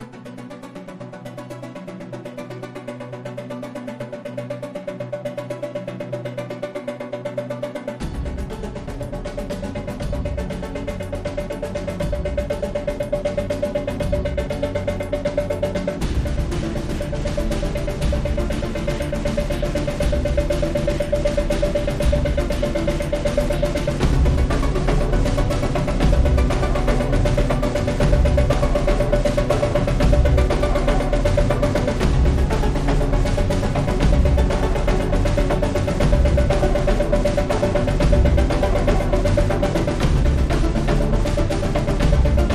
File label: Rhythm and Melody Elements 120 bpm